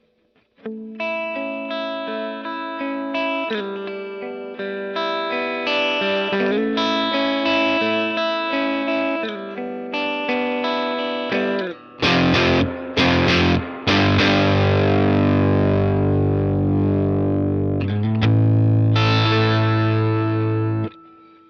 ring n rock, Swart STR-Tweed; Ring vs Rock!

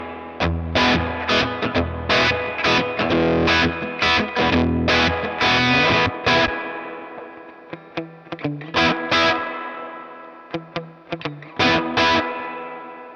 Tweed Dynamics; Tweed Dynamics 2

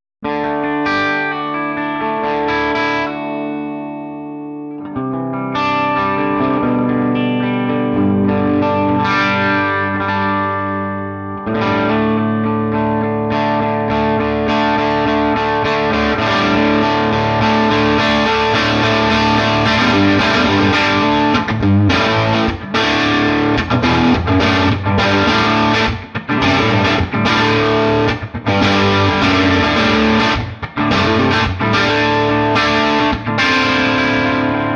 Swart 30 Crunch; Gibson SG-Crunch 1; super 30

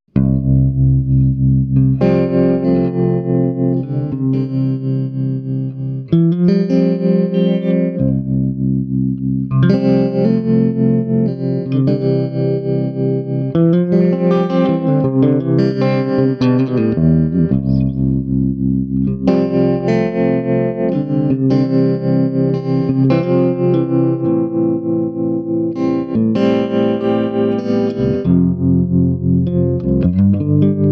Swart Amp; Back Porch Trem 3; used only the amps reverb and tremolo

